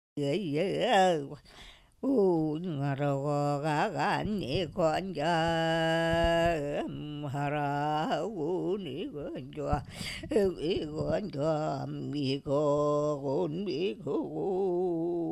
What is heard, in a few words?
Singing Angry song, evenki